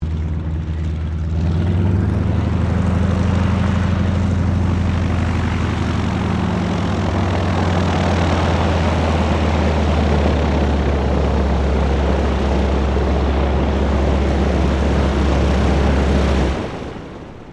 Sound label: AIRCRAFT AT 6D HARVARD MK III TAXI SHUTDOWN; Harvard Training Aricraft; Airplanes; Vehicles